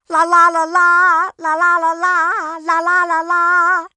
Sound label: Ball Sing